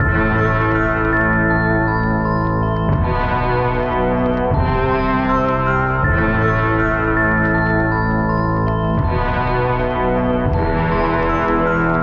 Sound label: majestic dark lord, Electronic Loops